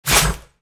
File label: attack launch; Li Li Shadowpaw Sound Effects; Li Li Heroes of the Storm Responses; warcraft